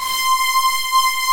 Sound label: SWELL; Pro-Rec - Synthsonic; Z1 DANCE